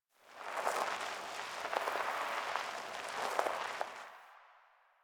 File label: Tire On Cement One Off